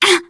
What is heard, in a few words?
hurt minor, teengirl survivor voice; Left 4 Dead; Source Engine